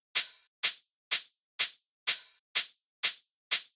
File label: deep house drums; Deep House Drums – FL Studio Tutorial